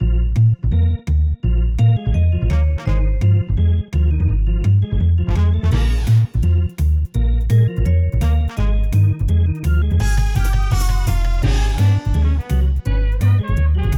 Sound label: jazz